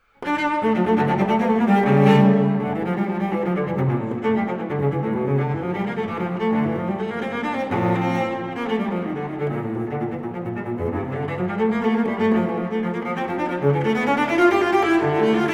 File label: solo cello; D minor; Courante; Suite for solo cello No． 2; BWV 1008; Bach; Cello Suites